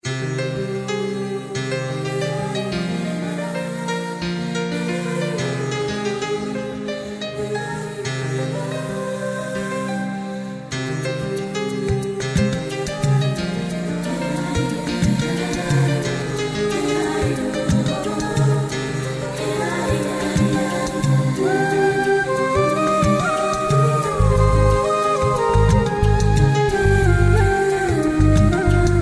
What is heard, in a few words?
Refreshing Tone